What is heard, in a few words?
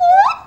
BIRDWOMAN, VOCAL